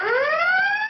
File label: klaxon, Cheesy Lo-Fi Sound Effects